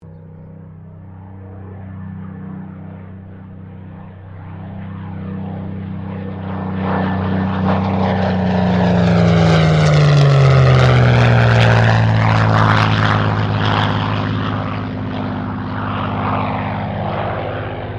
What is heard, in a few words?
AIRCRAFT SUPERMARINE SPITFIRE MK-XVI PASS